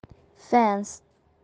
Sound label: Fence